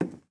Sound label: footstep wood